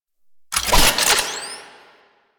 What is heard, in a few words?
Shotgun Quick Reload; Weapons; Warframe; Pax Duviricus